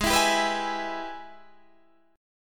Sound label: Ab Minor Major 7th Sharp 5th